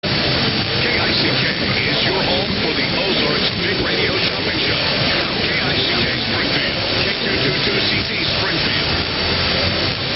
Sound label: kick; 1340; Springfield; News/Talk; TOH ID